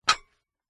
ancient game metal muffed set down gear open slide texture